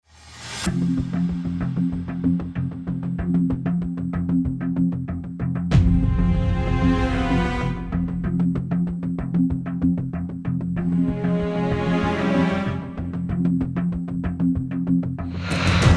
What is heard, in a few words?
Orchestral; Media Composer